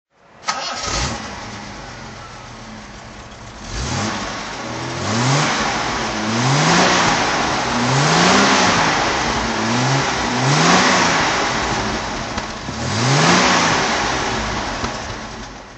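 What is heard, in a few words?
daimler 42 coupe throttle; Daimler Sovereign 4.2 Litre coupe, 1976; Engine sound; V3D/ ClassicarImages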